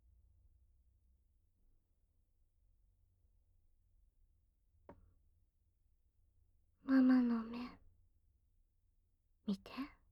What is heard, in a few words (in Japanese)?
甘々耳舐めちゅこちゅこ BGM＆効果音なし; 精通体験